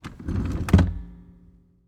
Full Size Van Seat Front Passenger Slide Forward; transportation

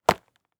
Sports Baseball Catch In Glove

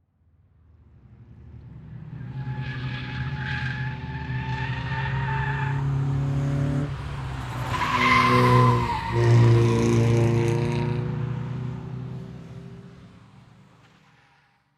Transportation Car Race Car Drive By Sharp Turn

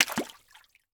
Liquid Water Water Hands Smack Water

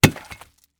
Impact Brick Drop On Leaf Debris

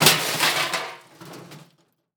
Crash Wood Metal Glass Debris Dumping On Cement; Crashes

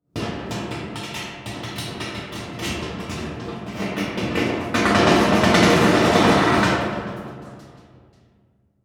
Industry Metal Grind Long

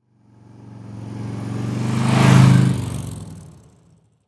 Auto Motorcycle Drive By Medium; Transportation

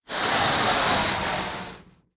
Cartoon Balloon Filling With Air From Tank; AdobeCC

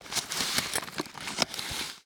Sports Stake Metal Stake Sliding Out Of Paper Bag

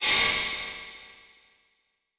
Title Transition Delay Short

